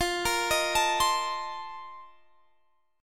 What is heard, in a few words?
A sharp Major 7th Suspended 2nd Suspended 4th